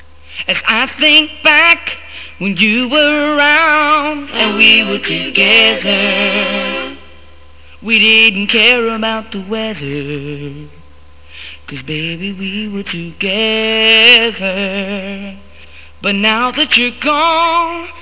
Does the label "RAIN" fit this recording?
Yes